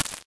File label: insert, syringe